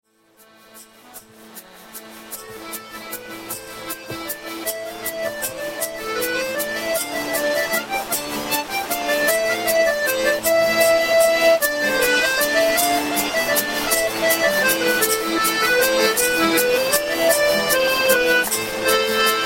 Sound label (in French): accordon sous la pluie